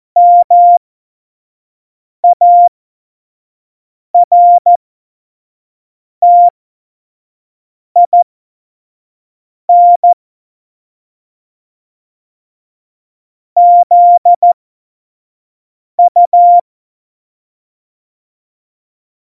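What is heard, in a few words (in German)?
Witz, cw morsen; Morsekurs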